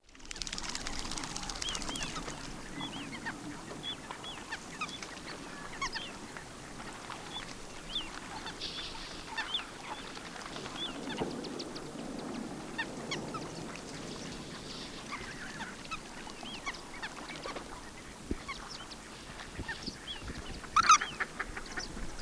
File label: Bird, Soundscape